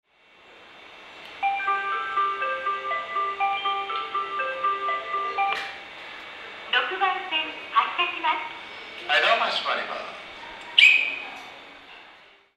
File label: ikebukuro melody, Tokyo Train Tunes; japan